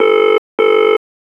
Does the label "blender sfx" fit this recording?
No